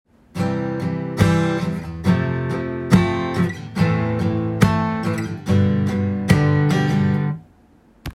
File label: palm